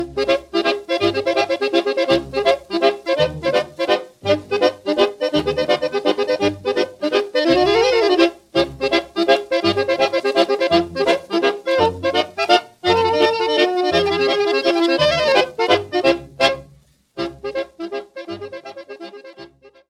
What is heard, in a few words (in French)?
Hambo, Suede